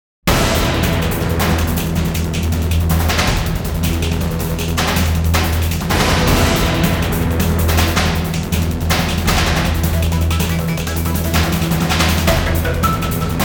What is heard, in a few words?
Abnormal Atmosphere; Akaneiro ni Somaru Saka Original Sound Track Gradation